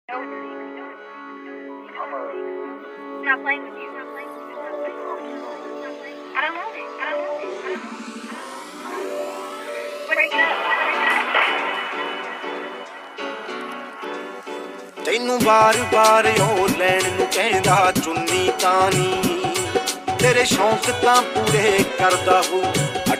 Dhol Remix Song

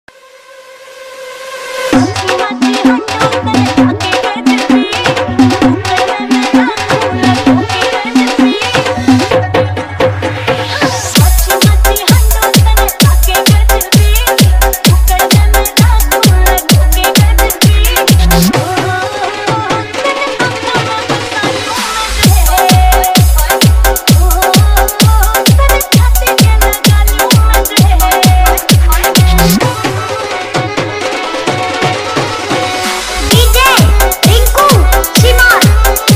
Remix Haryanvi Song

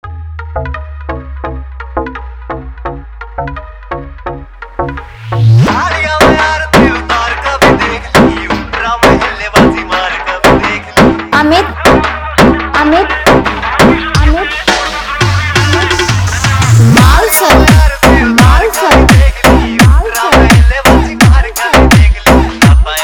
Hard Remix Haryanvi Dj Song

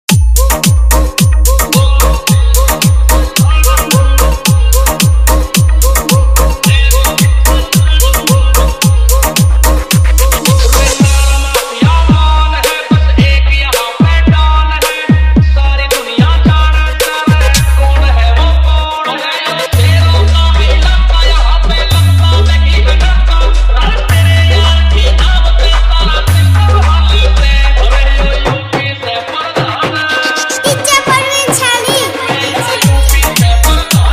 Dj Remix Hard Bass Vibration Mix

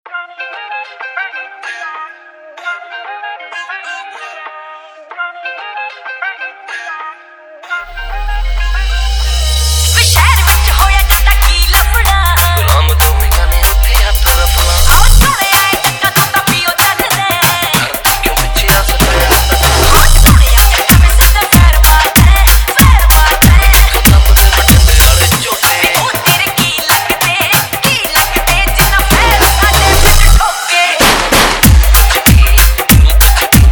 Dhol Remix Song

